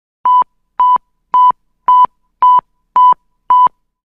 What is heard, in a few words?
Beeps Elect Sonar